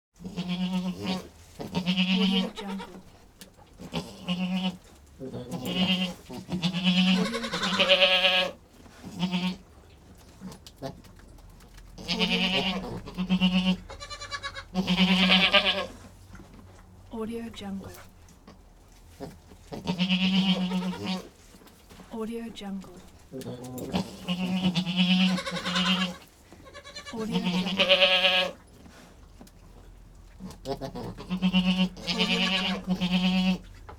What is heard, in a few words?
goats